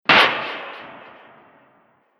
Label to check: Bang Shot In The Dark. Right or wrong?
Right